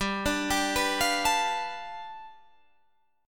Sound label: G 9th, Guitar Open D